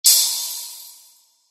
氷魔法 小; モノラル; 魔法効果音

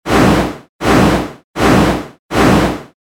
モンスターの羽ばたき 4回 速め

